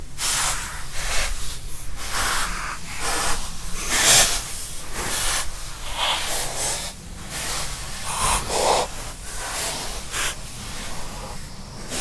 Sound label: mad breath, horror